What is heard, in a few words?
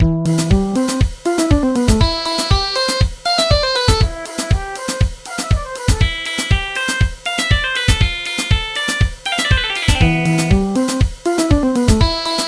Techno